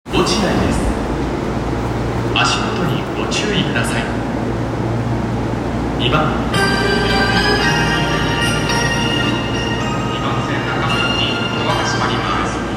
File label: train sounds; 東西線落合西